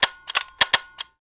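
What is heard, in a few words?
Electrical Tone Pulse; switches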